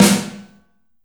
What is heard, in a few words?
snare, Percussion; realdrum